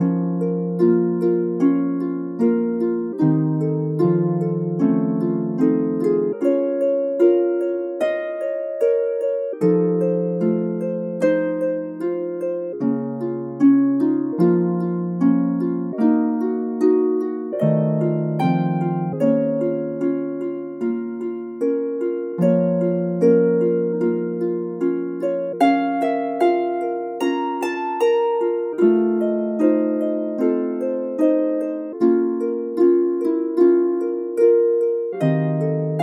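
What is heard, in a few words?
harp music, sleep sounds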